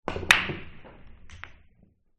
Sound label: Pool Break